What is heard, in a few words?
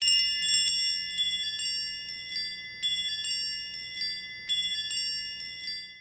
chimes